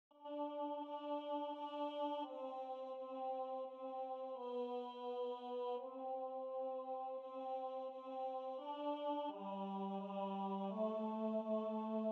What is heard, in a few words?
choir